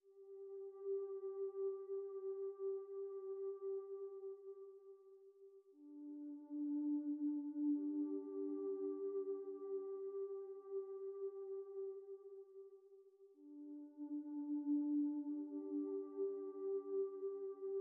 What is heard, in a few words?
126 BPM G Min Lead, Hip Hop; Melody Loops; Loop Stems; Cymatics; Cielo